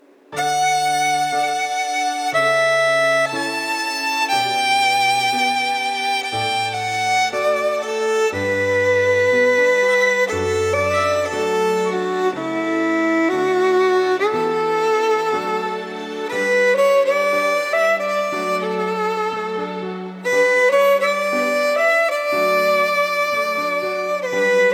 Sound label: Violin